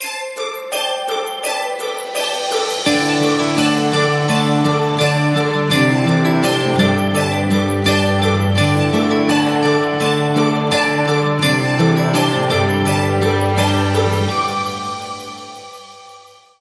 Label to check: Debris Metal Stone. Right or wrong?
Wrong